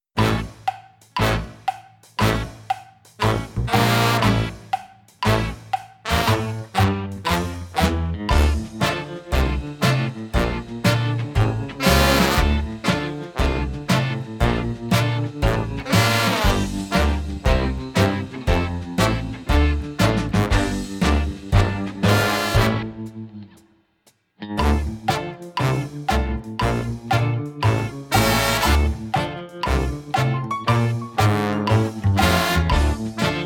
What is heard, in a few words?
Instrumental